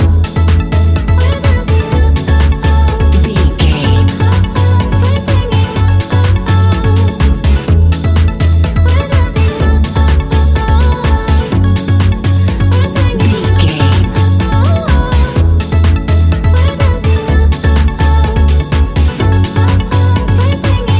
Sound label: tropical embrace, A fast paced piece of club music; A fast paced piece of club music perfect for the nightclub, and a youth orientated visual scene.